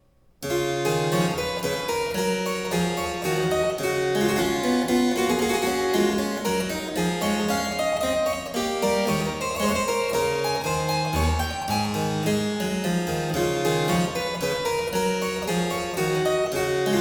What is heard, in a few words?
Suite in E flat major Menuet; Suite No 4; BWV 815; Johann Sebastian Bach; French Suites